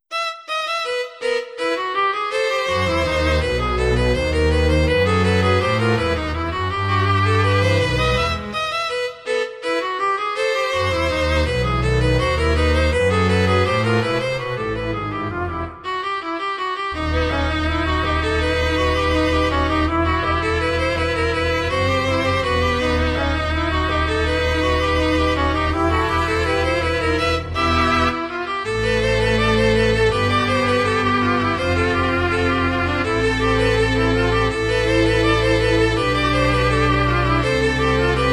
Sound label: Fugue